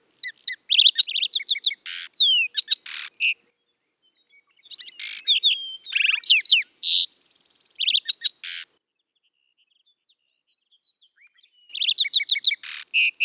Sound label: moving bird Sparrow